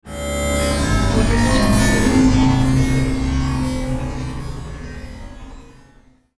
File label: scary low synthetic computer drone